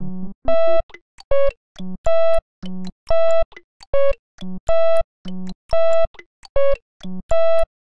Test Song; Mario Paint Prototype

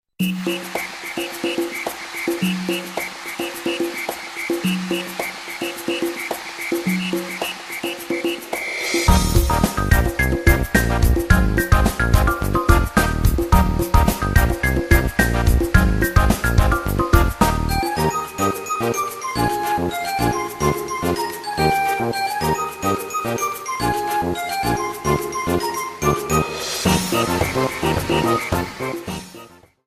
Mini-Game Stadium Theme

